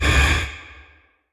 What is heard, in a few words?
Spider Short Scream